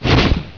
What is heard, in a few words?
Ship explodes; Bedlam 2 1.1.1; Bedlam Sounds